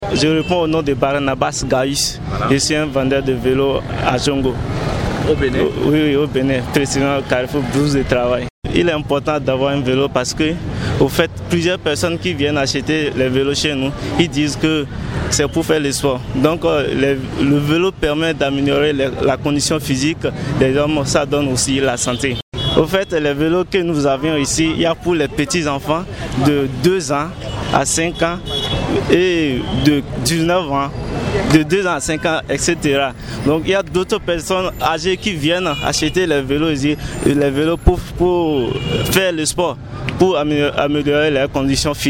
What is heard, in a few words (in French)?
Vélophonie; Promédia jeunes